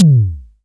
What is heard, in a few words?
ELECTR TOM, ELEC DRUM; USB Soundscan vol.20; Fresh Disco House I; AKAI